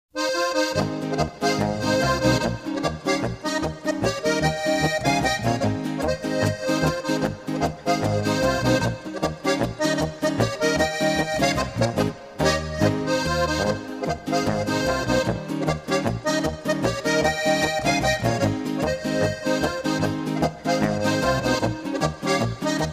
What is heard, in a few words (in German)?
Trio spielt auf Polka; Soelktal